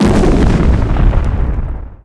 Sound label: Boom; Falcon 40